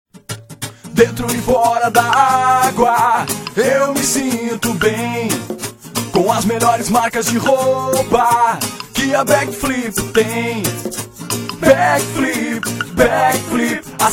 jingle back flip

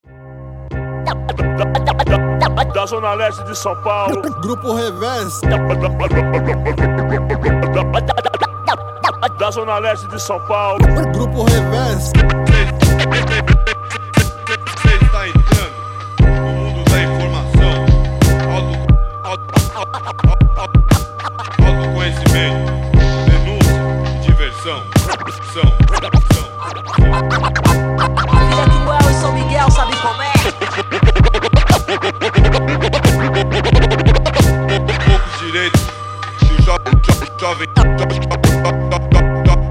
intro beat; beat

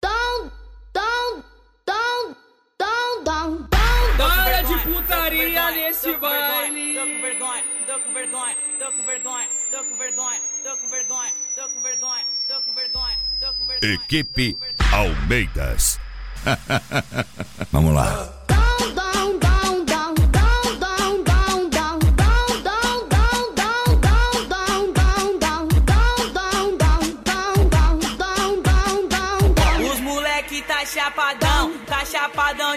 funk

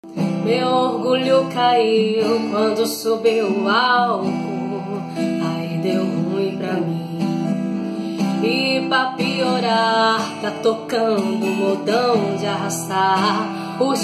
reggae remix

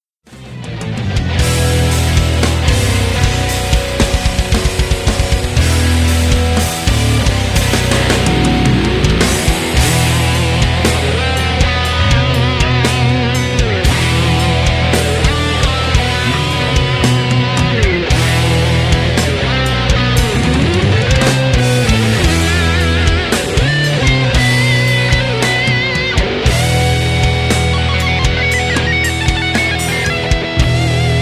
Groove Guitar solo

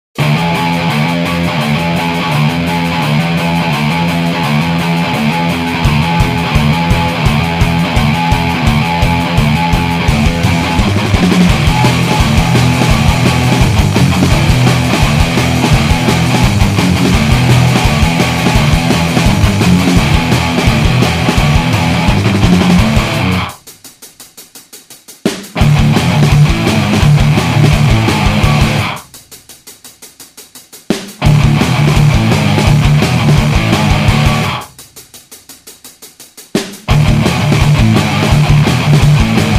instrumental

